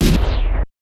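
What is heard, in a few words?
kawai k4 synthetic, snare drum; Polyend; Legowelt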